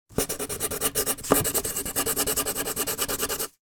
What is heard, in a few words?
office pencil draw rub on paper